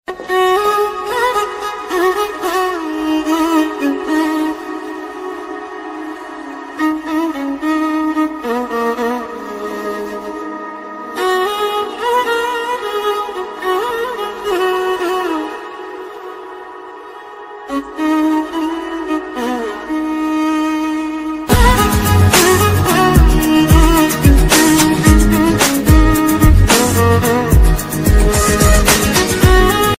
movie bgm ringtone telugu bgm ringtone south indian bgm ringtone; sr kalyana mandapam movie